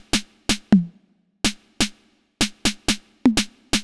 drum sixty percussion 125, Drum Loops, Classic Chicago House